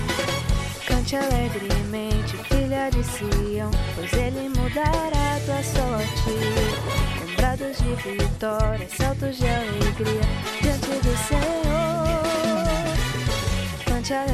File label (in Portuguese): tenor